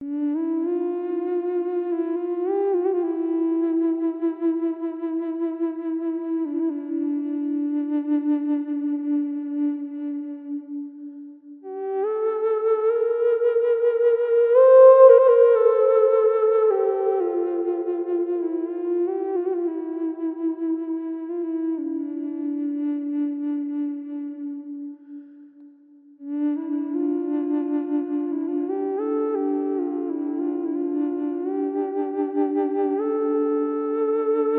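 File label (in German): Aeolian D mit Reverb, drone flute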